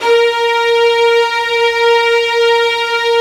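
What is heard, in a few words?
violin section, Arco Violins; E-MU Producer Series Vol. 2; More Studio Essentials; Composer; Arco Violins III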